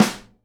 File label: snare damp, Live Snares; PlatinumPhattCD1; E-MU Producer Series Vol. 8 – Platinum Phatt